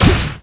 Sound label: Bounce Ball Hit